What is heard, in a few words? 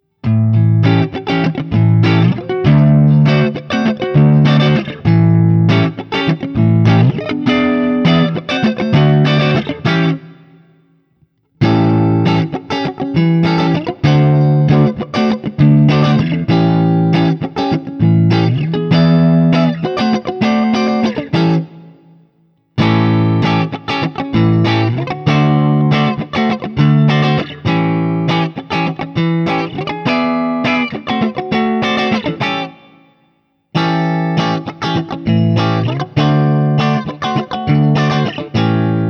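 Guild X92 Citron, Clean, 7th Chords; Guild X92 Citron Breakaway from the depths of 1984; ODS100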